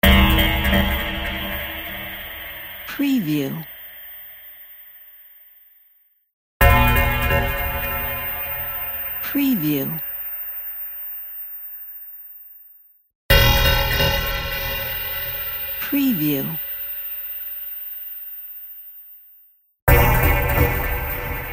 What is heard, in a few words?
dark space stab